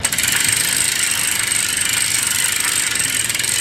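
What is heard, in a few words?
Bicycle Chain Freewheel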